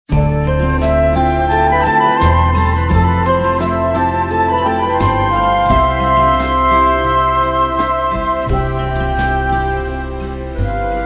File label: Long Sting